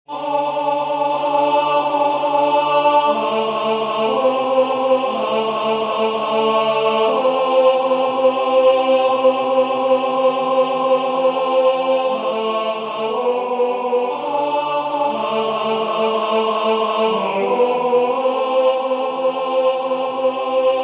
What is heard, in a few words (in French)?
Je vous salue Joseph tenor; Du Jonchay